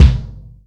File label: verby steel kick drum sound g sharp key